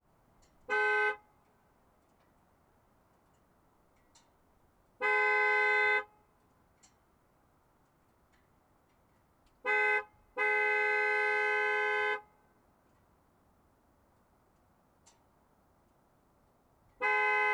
Dodge Ram Horn Honk Various Exhaust Right; Dodge Ram 1500 HEMI SUV 2014; Pole Position

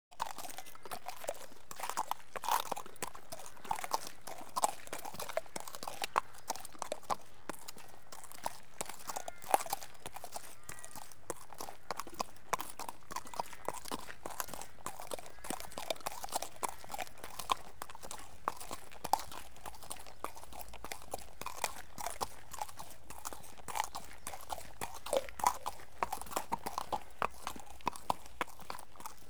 Horse Walks On Muddy Gravel Track; Animals and Birds; BBC Sound Effects Library

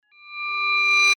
Sword Sound; FXHome; Fighting Sounds

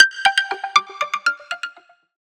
alert gen echo; App; 3maze; App FX

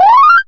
pickup; Kenney's Sound Pack; Retro Sounds 1

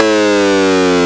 gameover; Kenney's Sound Pack; Retro Sounds 2

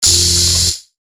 Saber; FXHome

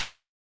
Mid Perc, Data Mosh Hits; Snare - Clap - Mid; 99Sounds; Mangling Audio

